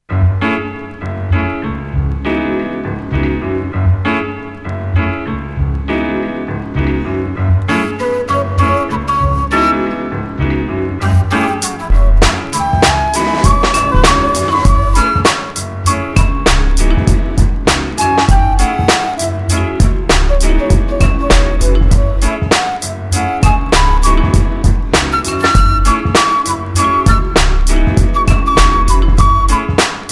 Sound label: Flutemental